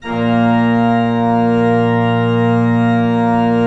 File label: ORG A#2, KEYS; Synclavier Sampler Library